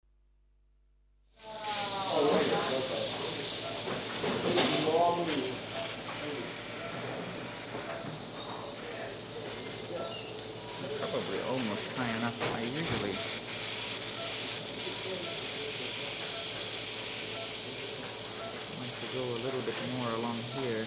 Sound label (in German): Operationssaal Atmo; Industrie